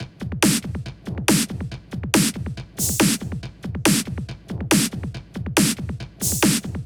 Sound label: 140BPM Genetic Kit